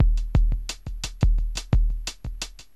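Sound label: groove bass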